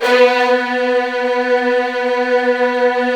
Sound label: Virtuoso Strings; ILIO; Kirk Hunter